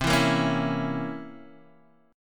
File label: C 7th Flat 9th C Guitar Drop D